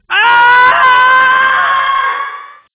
small health kit

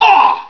pain; payned

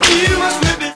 whip hit

